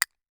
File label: castanet2 ff stereo, Castanets, Hand Percussion